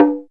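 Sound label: Ethnic Perc, drum; Percussion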